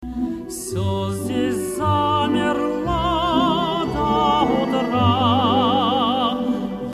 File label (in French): Montee Majeur, montée en majeur